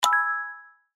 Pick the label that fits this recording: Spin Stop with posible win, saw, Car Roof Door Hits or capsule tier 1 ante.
Spin Stop with posible win